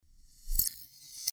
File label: Sword Sound; FXHome; Fighting Sounds